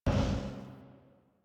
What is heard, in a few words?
Scrap Container Loop Stop; Warframe; Missions; Zariman; Zariman Assassinate